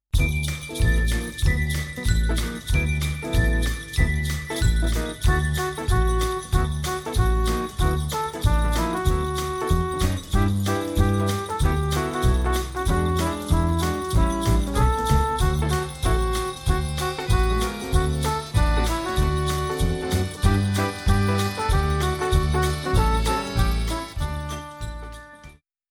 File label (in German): Jingle Bells Playb